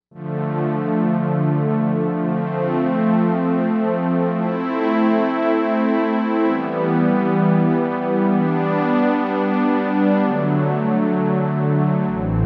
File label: Analogpad, Korg M1Rex; Factory Patches